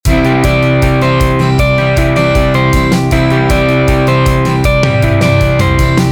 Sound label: cadd9